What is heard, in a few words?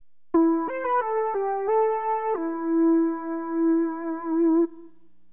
FM lead, keyboards; HipHop Keyboards; LiveAct Pool 1